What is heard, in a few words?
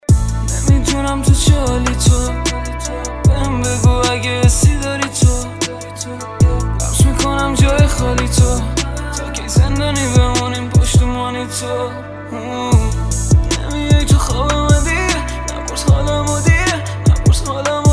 Mobile ringtone